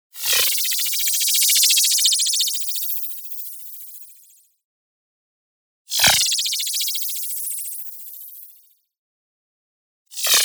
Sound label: MOVEMENT FAST Squelch Flutter; boom library; Anime Essentials; DSGNMisc